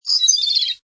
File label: Tui flutter, Exotic bird - New Zealand bird Tui chirping, fluttering.; Birds; Animals